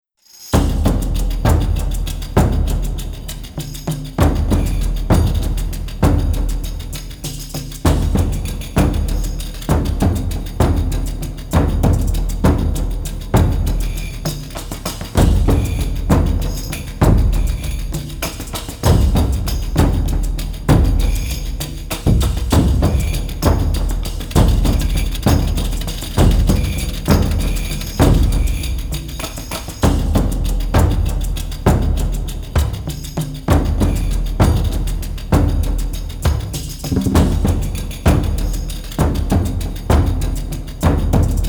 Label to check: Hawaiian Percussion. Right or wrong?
Right